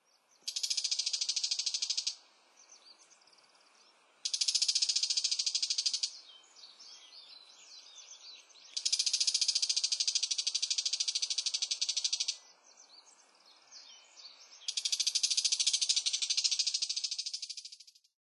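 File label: bull-headed shrike, utsunomiya; mozu; koe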